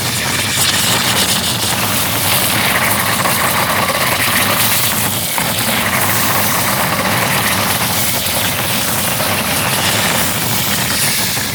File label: Dragon Elemental Breath Poison Loop; Warframe